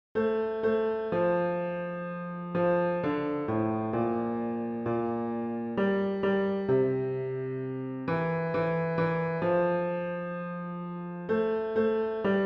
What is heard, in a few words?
Bass